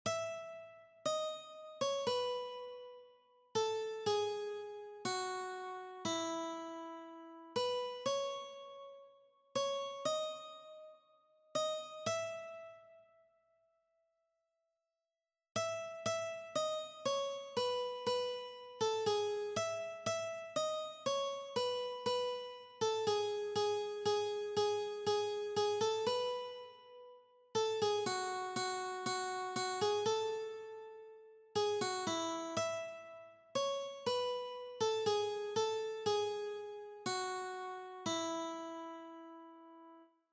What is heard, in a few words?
Joy to the world Guitar One String